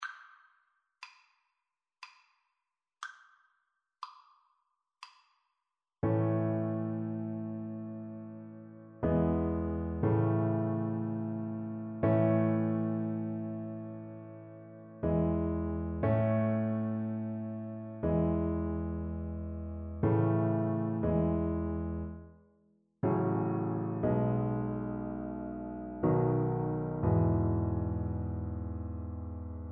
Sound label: clarinet concerto, violin